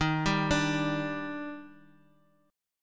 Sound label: Eb Major 7th; EbM7; arpeggiated; JGuitar